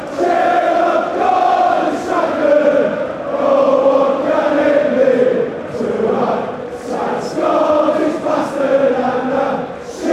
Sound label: cheer, fanchants; gorden strachen